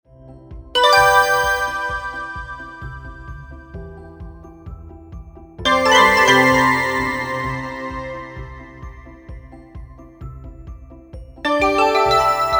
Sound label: NOTIFICATION